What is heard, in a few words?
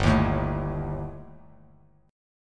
G Suspended 2nd Sharp 5th, Bass Standard; strummed; JGuitar